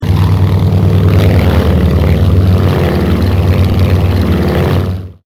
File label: engine